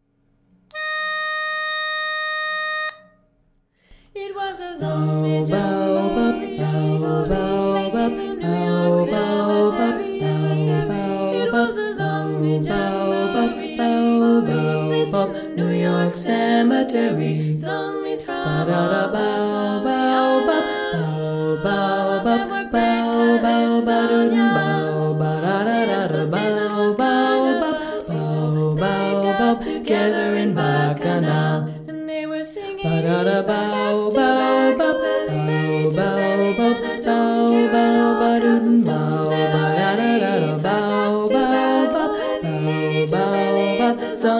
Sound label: bass